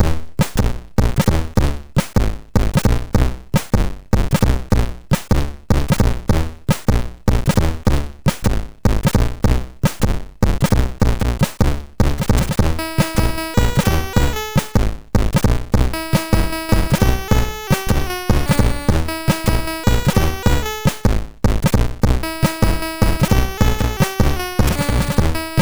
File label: Blizzard part, MOS6581R3